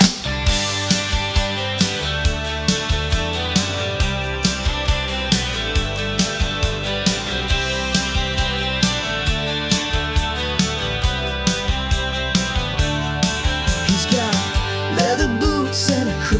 jangle pop

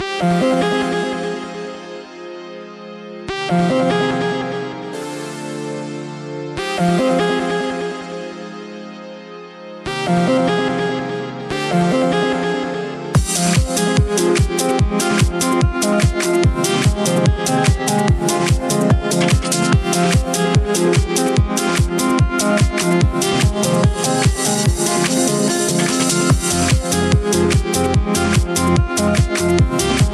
Funky House Vibes

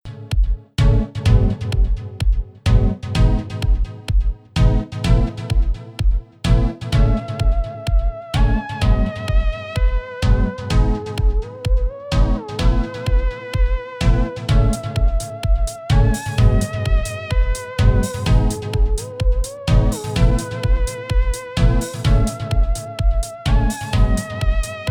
aerobic dance, dance with bells 432 hz; 127 BPM

